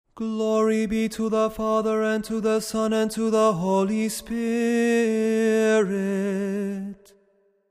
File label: Tone 8 glory be